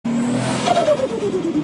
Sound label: BIG Turbo Ringtone